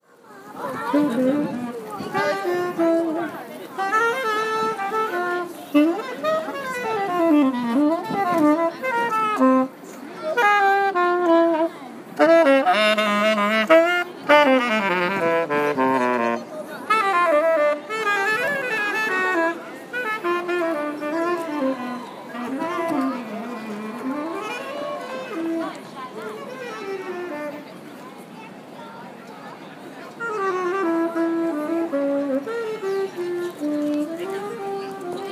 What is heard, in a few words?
Central Park Saxaphone